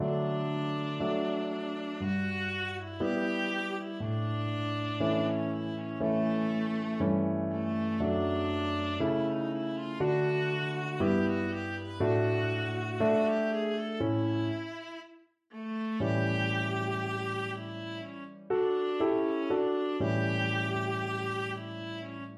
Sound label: romance, viola